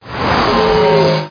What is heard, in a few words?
truckin